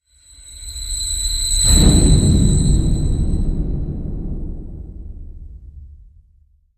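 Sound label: Hit Horror Whistle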